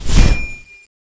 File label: axe deploy; oblivion darkangels